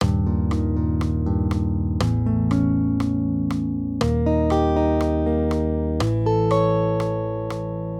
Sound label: maj triads; Eb-A; accompaniment